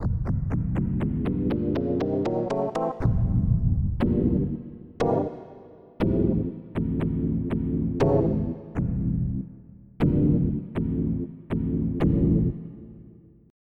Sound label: Kicks C1-G#1; Onj's Soundfont